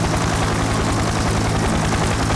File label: engine; Helicopter